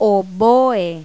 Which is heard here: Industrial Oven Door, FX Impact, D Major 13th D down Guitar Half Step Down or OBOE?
OBOE